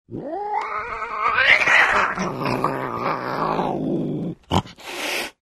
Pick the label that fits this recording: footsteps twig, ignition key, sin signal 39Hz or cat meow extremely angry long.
cat meow extremely angry long